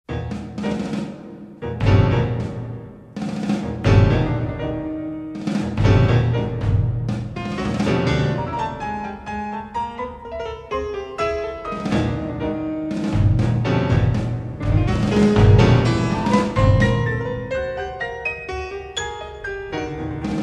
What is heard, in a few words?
Tempo di Tango